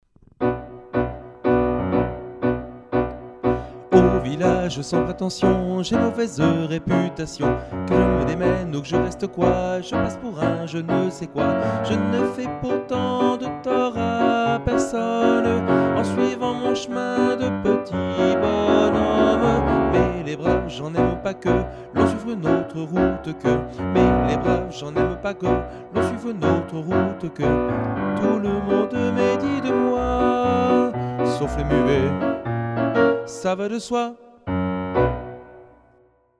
soprano

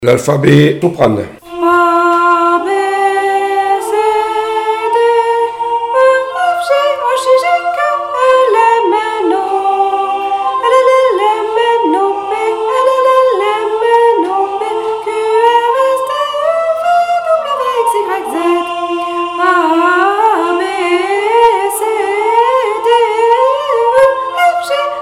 chant Soprano, chorale; L alphabet de Mozart

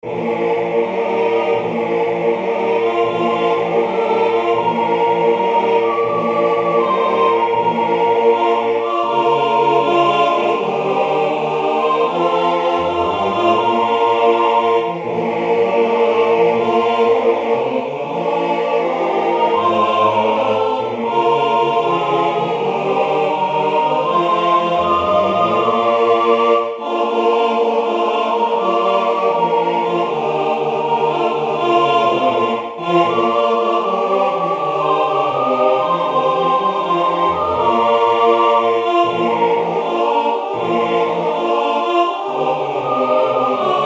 Partons la mer est belle tutti

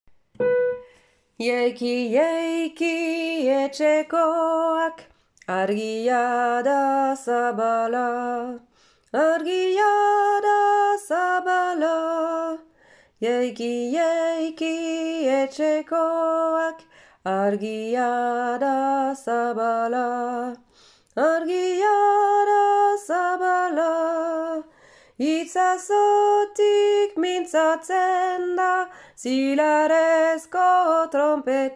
chant tenors

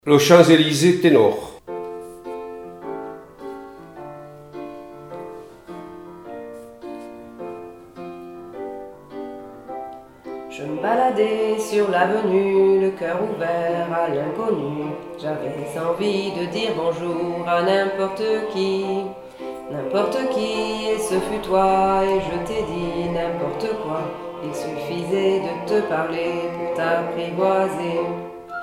chant tenor, chorale

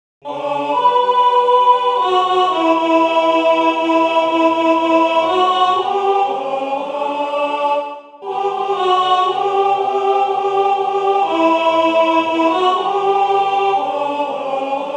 Le refuge alto, chorale

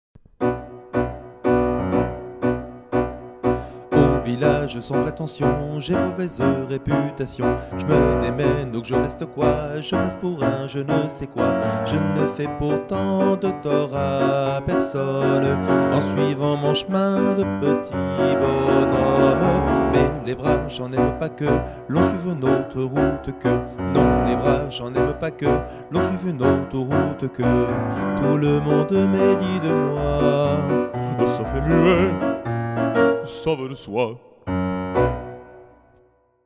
alto

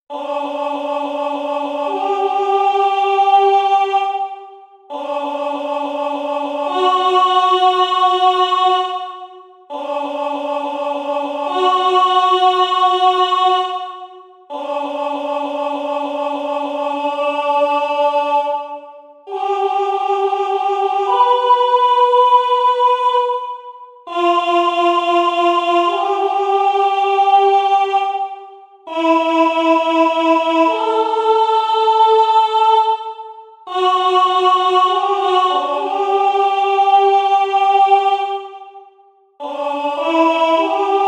alto

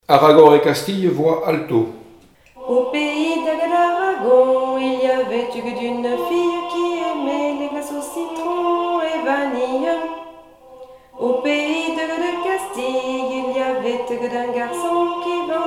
chant Alto, chorale

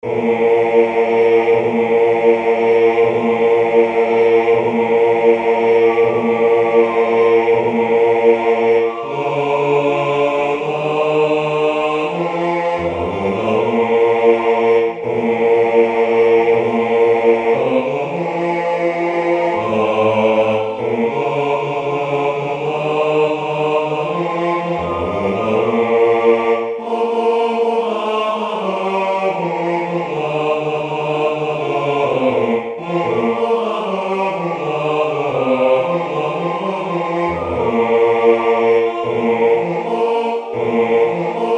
Partons la mer est belle basses

